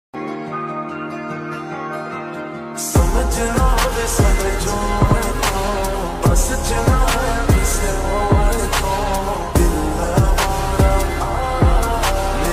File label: slow reverb ringtone love ringtone romantic ringtone